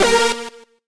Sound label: synth; osu